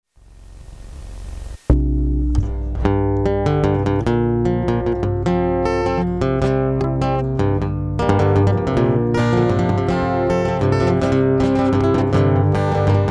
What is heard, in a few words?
Acoustic Dream